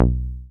SYN 303, Roland TB-303

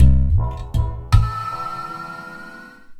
Udu; Sonic Foundry (Sony Creative Software); Ma Ja Le Ethereal Textures

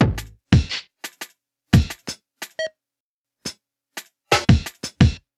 Drum n Jungle Breakdown

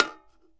can hit; AKAI S-Series CD-ROM Sound Library VOL-6

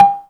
ZYLIMBA; Greytsounds VOL-1

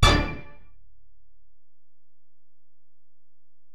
Bell, Alesis DM-PRO

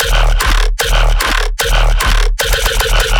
bass loop 150 D#; emergencybroadcast; Virtual Riot

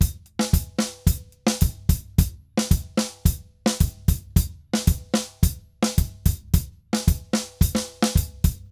Drums Murga 110 bpm; Best Service; Festa Latina VOL-1

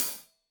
Hat Loose, Hi-Hat, Cymbals Stereo

